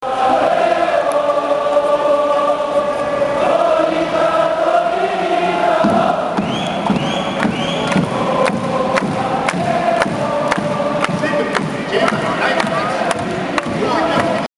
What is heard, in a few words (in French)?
oh allez oh Oita Trinita oh